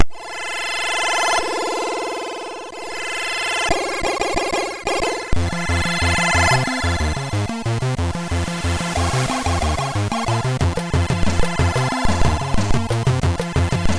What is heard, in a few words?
sid MOS6581R3; Check Out